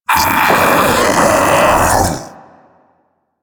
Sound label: Infested Splitter Joined Vocal Alerted; Warframe; Grey Strain